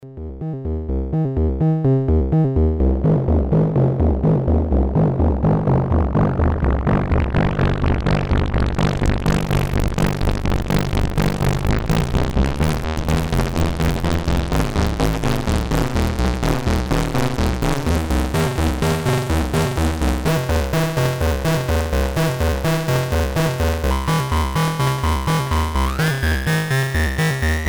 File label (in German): ERICA SYNTHS Black System Polivoks VCF Modulation durch VCOII Sinus bis Audiobereich